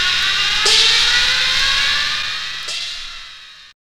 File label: CYMBAL